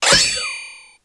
Grito

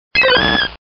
Grito; Corsola

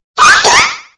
Grito de Sobble

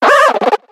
Grito de Simipour

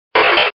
Grito de Mudkip

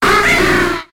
Grito de Murkrow

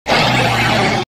Grito de Lycanroc forma crepuscular

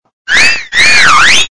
Grito; Gossifleur; Gritos de Pokémon de la octava generación

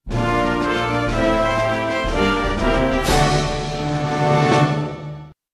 Tema de victoria; Metroid; SSBM

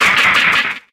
Grito de Ludicolo

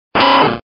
Grito

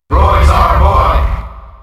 Grito del público; Roy; SSBM

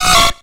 Grito de Furret

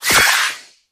Grito de Inteleon

